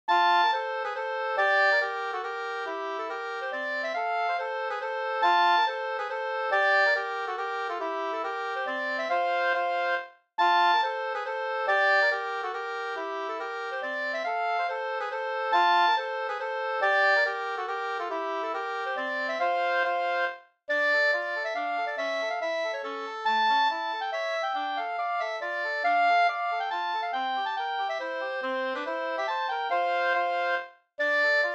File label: mazurka; Martis-Johans mazurka Nb 2st